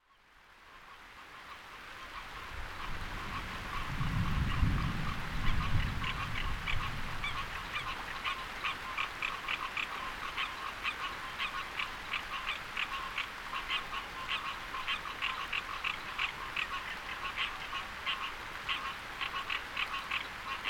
Rain Shower Thunder; Big Cypress; Thunder Gods

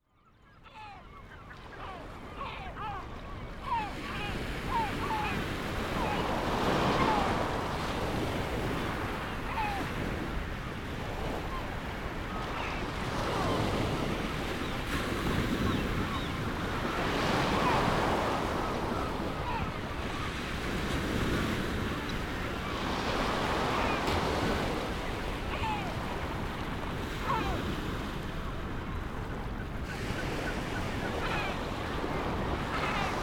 Gentle Waves Gulls Ocean Waves

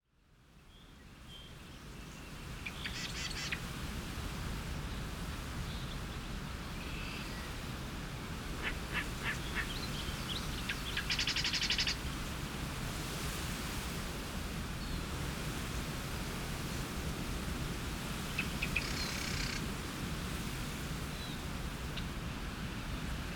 Cattail Wind Dance; Wind Songs

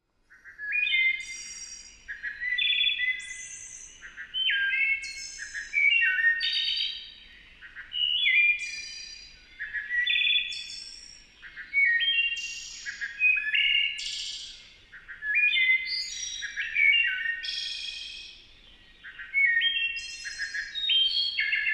wood thrushes countersinging

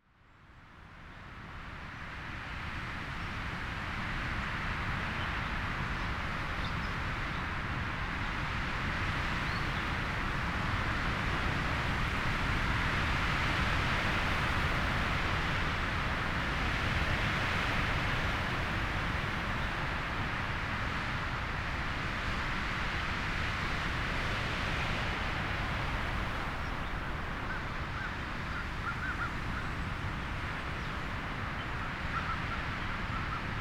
Wind Over the Ridge Wind Songs

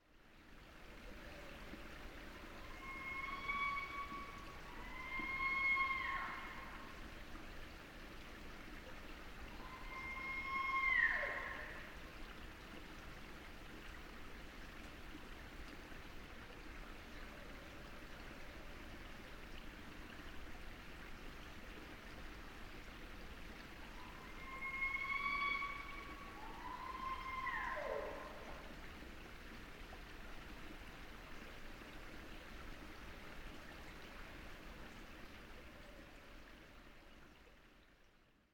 barred owl scream; lang elliott

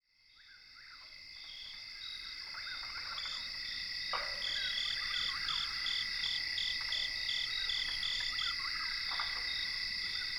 Insect Lullabies; Pungo Symphony

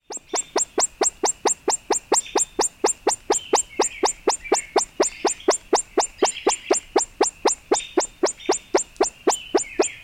ruffed grouse quirts

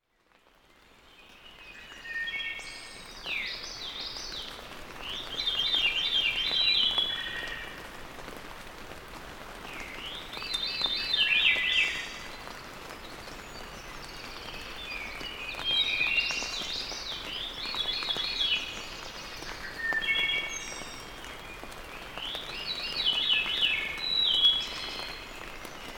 Thrushes Singing in Light Rain

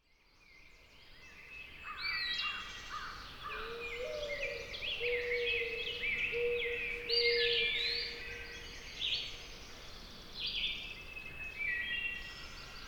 Woodland Medley Birds at Dawn

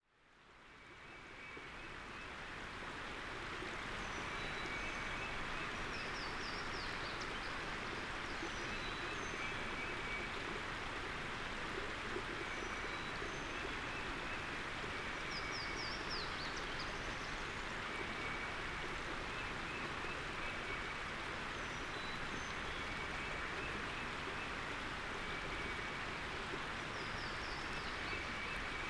Brook Atmospheric Surround; Toothwort

